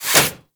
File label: Mods Working To Sleep, Machines; Warframe; Hub; Liset Machines; Tenno Mods